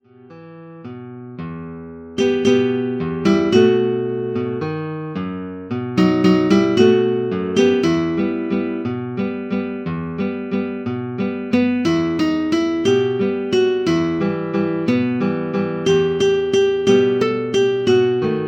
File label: synth